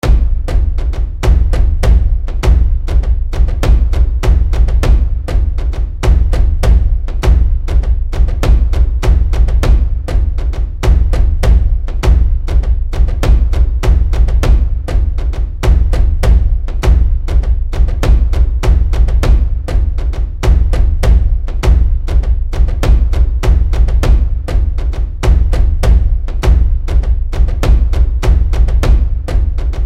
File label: War Drums Loop; Musical Sound Effects